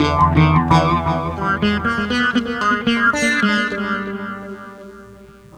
Coral Sitar

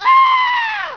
Death Scream; PowerPete Demo

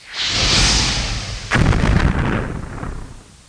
Missile; CIV2; WARHAM

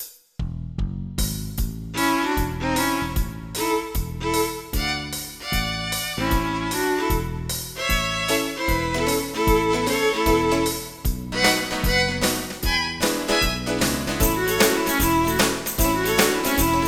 country; MIDI Music File; ez key

